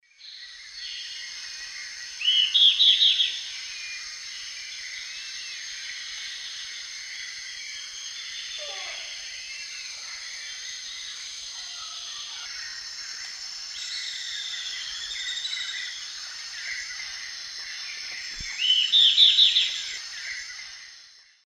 Myiopagis flavivertex, call; S. America; Eastern Lowlands

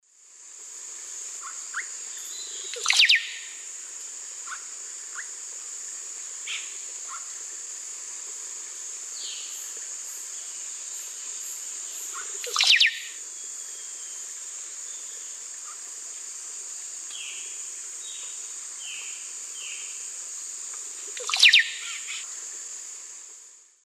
Psarocolius angustifrons, song and calls; S. America; Eastern Lowlands

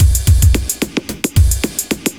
block rock kit

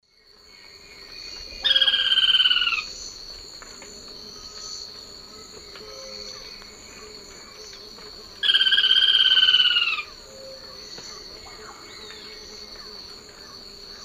Automolus rufipileatus, song; S. America; Eastern Lowlands

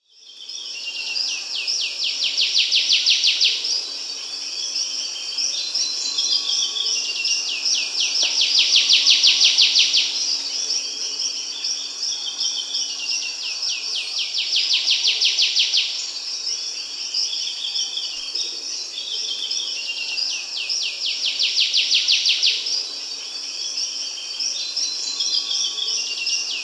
Buff-rumped Warbler (Basileuterus; S. America

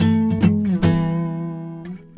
Slinky Guitar; Guitars

